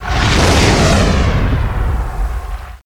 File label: Rocket Whoosh; Learn to Fly 3